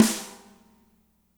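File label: snare right; Spectrasonics; Burning Grooves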